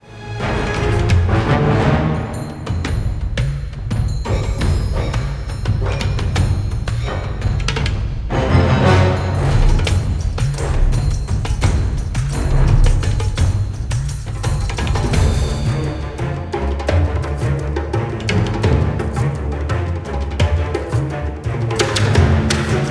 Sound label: percussion; Virtual Orchestration